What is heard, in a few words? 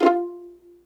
F4 PIZZ, Violin; Q Up Arts; Denny Jaeger Master Violin Library; PERFORMANCE